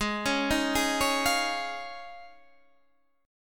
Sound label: D sharp 7th Suspended 2nd Suspended 4th G sharp